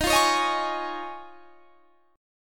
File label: D sharp 7th Suspended 2nd Suspended 4th D sharp; D#7sus2sus4; strummed